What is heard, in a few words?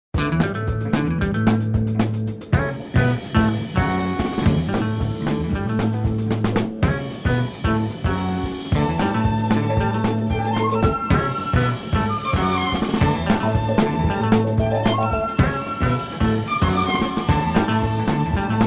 Pop